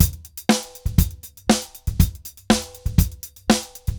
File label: DRUMS, DRUMS SAMBA; Best Service ProSamples vol.14; AKAI